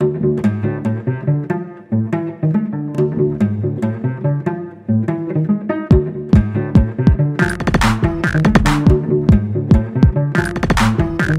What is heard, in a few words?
Dirge start wave; Operation Starched Silliness; Deluge Dirge (custom mission); teamfortress